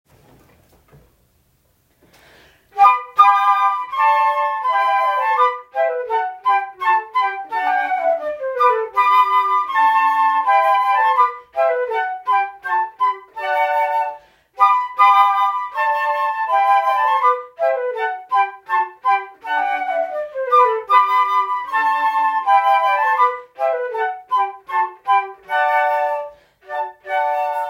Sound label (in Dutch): Rigaudon, duo fluiten; L.C. Daquin